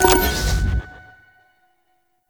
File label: Click; Warframe; Duviri; Hack Minigame